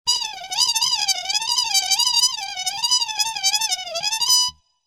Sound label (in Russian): suetlivoe dvizhenie polet shmelja